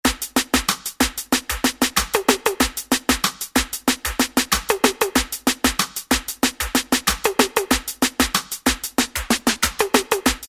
Ragga 94, Dance; Roland SR-JV80-06

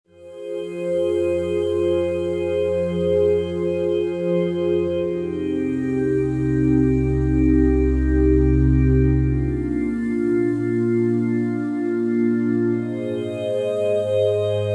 Glass Voices, Roland JV-880; Factory Patches

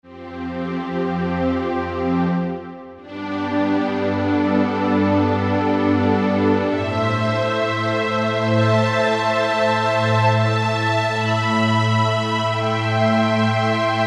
Ana Strings, Korg 05RW

